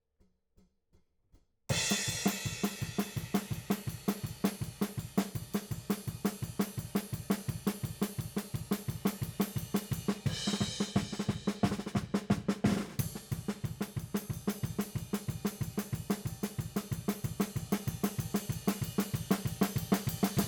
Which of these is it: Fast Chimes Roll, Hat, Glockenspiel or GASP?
Hat